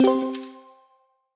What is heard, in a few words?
Spin; Lock A Luck